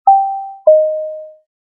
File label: dingdong